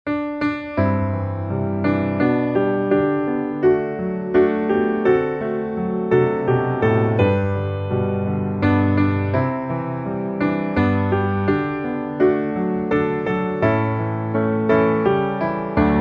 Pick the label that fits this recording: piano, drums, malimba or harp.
piano